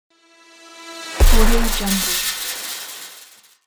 impact long spill

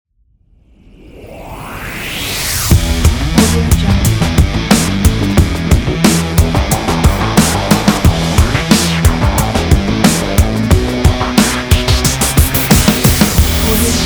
action rocking intro trailer opener

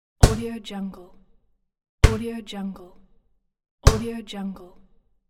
basketball

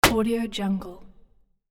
wood hit split

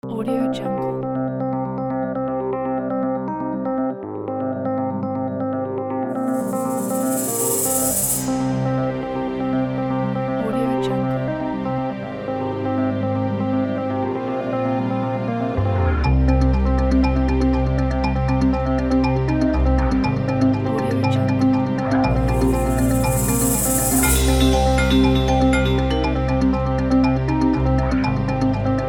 liquid bells

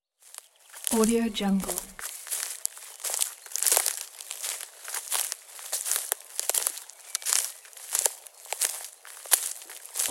walking on grass and branches in forest

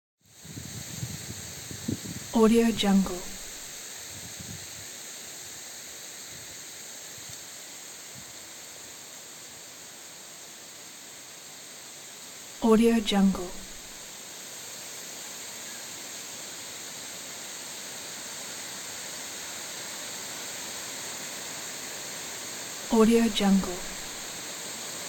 wind in the trees

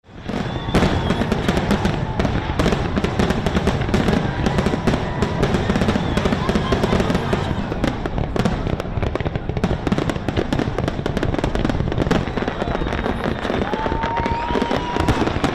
fireworks celebration

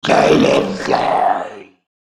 troll commander; sonic-boom

